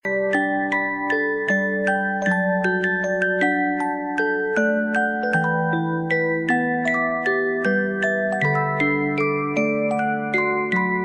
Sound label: windchime melody; ringtones; sms